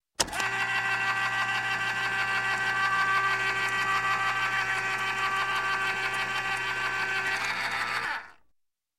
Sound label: Can Opener, Electric Opening Can With Electric; Sound Ideas 2000 Series